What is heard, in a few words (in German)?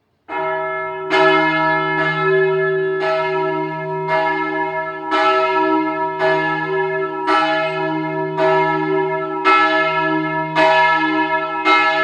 Glocke, Reformierte Pfarrkirche; St. Blasius Ziefen; die glocken